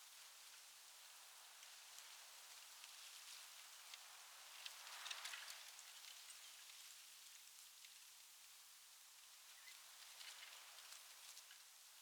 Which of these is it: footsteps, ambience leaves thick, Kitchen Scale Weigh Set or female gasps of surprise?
ambience leaves thick